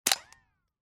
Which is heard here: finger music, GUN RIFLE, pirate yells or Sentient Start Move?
GUN RIFLE